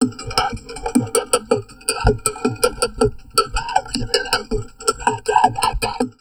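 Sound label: talk, drum loops crazy processed, 160 bpm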